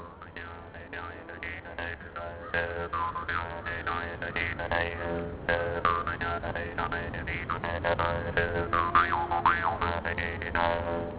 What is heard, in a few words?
twang